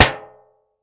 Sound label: tap